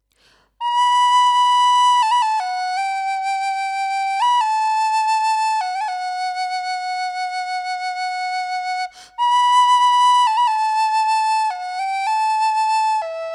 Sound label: whistle D rosewood